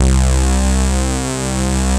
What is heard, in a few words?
MG SYNTHS; Pro-Rec - Synthsonic; JV ANALOG